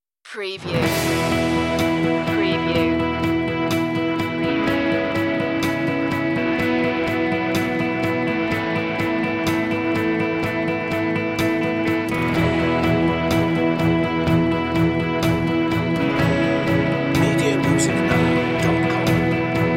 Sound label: no marching snare